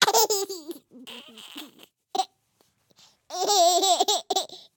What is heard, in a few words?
tickle, baby; CosmicRage